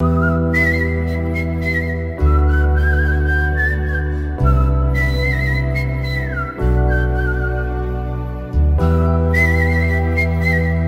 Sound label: whistle sound ringtone; animal movie